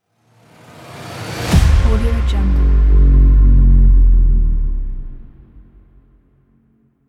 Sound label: dark cinematic logo button; lunamycreations